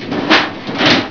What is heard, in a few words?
LOOM